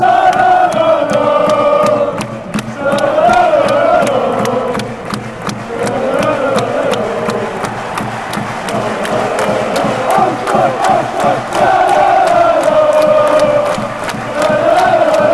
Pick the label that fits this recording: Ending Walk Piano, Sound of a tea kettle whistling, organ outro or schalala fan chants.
schalala fan chants